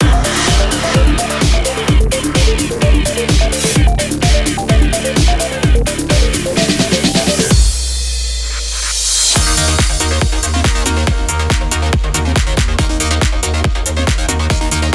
Goal last; Gran Turismo 6

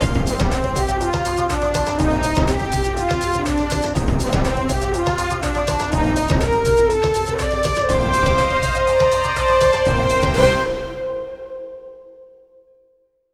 Stinger; It's All Downhill From Here ~ Pterodactyl Terror; Scooby-Doo 2 Monsters Unleashed

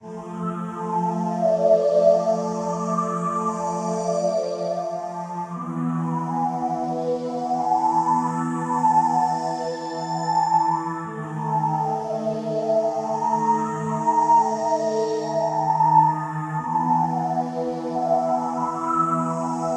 bgm game no piano mirror

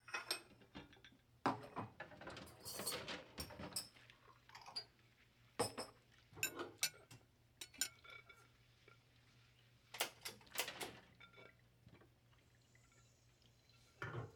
Ambient Kitchen And Office Quiet Loop

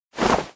Movement Flying jump down; Talking Tom Gold Run

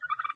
Turkey Kid Idle

